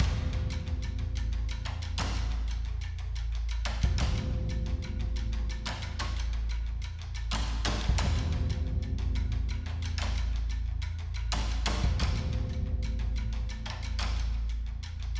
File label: Enemy Fight Stem; Rocky Valley